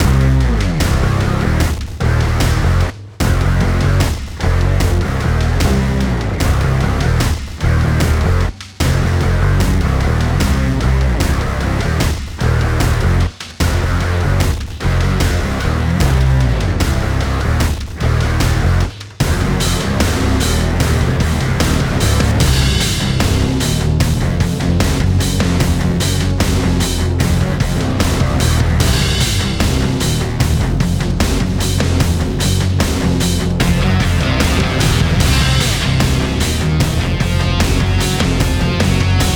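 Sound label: Music Industrial Pre Race 75bpm; Forza Motorsport 6 Apex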